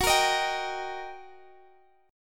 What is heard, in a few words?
Eb Major Gb; strummed; Eb/Gb